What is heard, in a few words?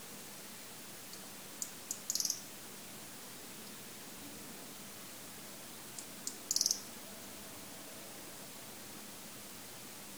strudulating